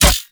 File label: Mech, Weapons; Prime Derelict Cernos